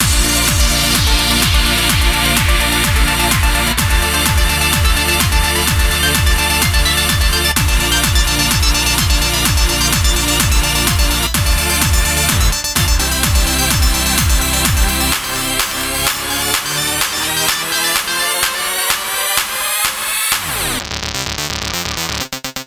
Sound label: rise; Intake